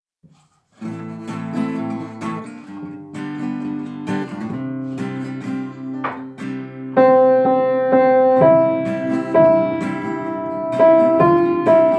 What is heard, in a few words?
Alto Piano